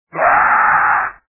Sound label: spider death